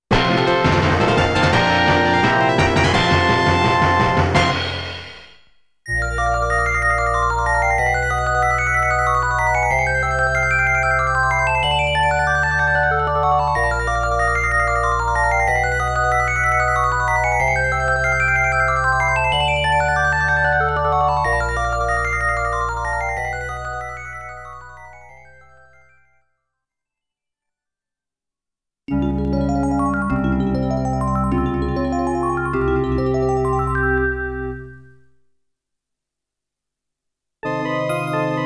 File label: Stage Clear Fanfare~Stage Clear Music